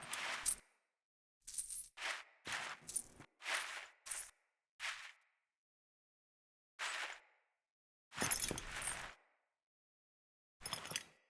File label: Spell Foley; Verminia; Cinematics; Bromius; Bromius Quest Verminia Spell